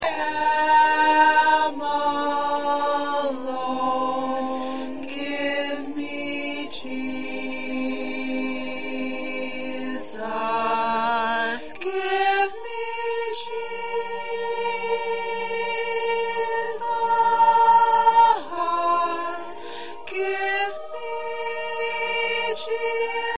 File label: Alto